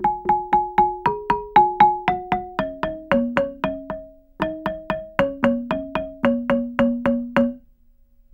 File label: GAMBANG PH; Spectrasonics; Heart of Asia